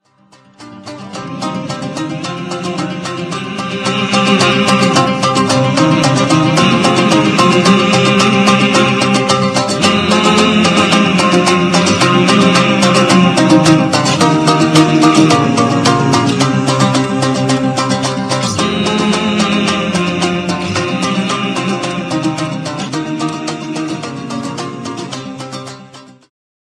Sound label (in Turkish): dombra zil sesi ringtone; cengiz han filmi muzigi